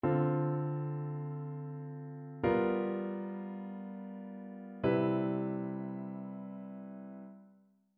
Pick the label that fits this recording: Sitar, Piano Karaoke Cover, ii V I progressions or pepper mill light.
ii V I progressions